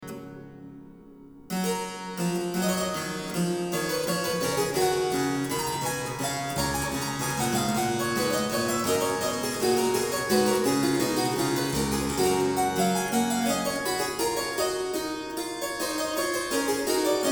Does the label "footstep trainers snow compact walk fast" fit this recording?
No